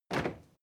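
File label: step crowd march normal single left, footsteps; schritte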